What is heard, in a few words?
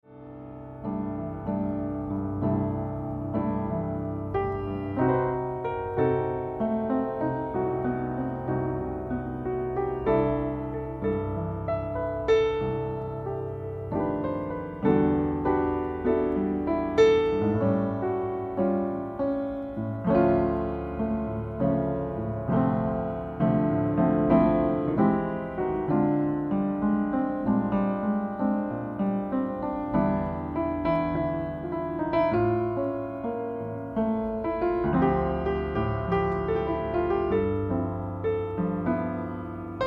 meditation, jazz piano